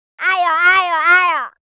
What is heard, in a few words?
child ew; 人聲